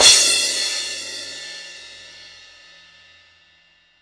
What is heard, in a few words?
crash; East Collexion; Drum 1 Dry